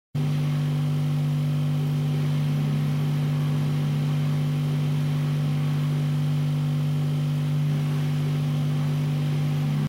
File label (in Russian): shum holodilnika; шум работающего холодильника; Включение, шум работающего холодильника и выключение